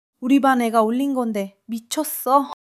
laughter; monologue

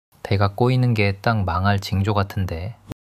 struggle solo struggle

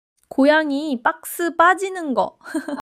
laughter

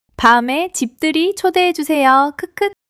laughter long laughter

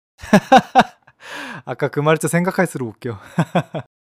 laughter solo laughter

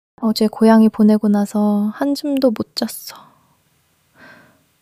crying; monologue

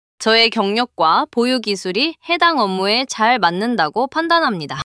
neutral long neutral

